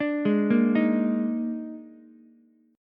D sharp Major 7th Suspended 4th, D sharp, down, Ukulele; arpeggiated; D#M7sus4; JGuitar